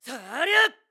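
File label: Sword Spin, voice; Link; BOTW; Breath of the Wild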